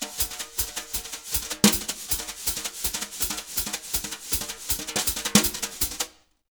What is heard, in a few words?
Jazz Latin Drumloops; USB Soundscan vol.08; AKAI